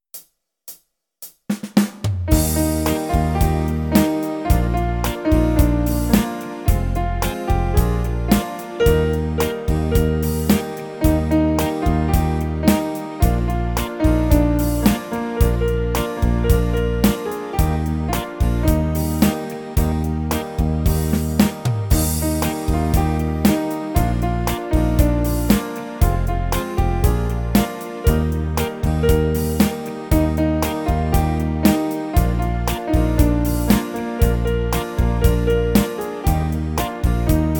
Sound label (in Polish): akompaniament